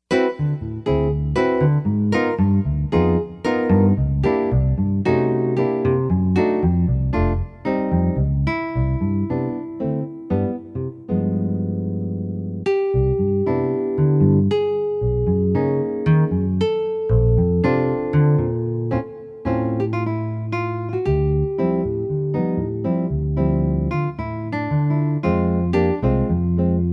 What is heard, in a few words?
guitar